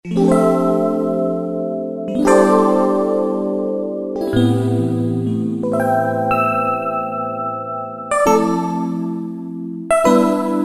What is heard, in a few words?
EP IceRing, Yamaha TG77; Factory Presets